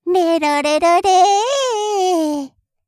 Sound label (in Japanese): 舌だし失神風